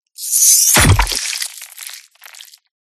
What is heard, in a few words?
DESIGNED CINEMATIC BLADE STAB; PMSFX